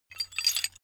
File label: metal handling pieces